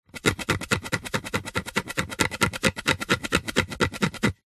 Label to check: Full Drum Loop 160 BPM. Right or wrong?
Wrong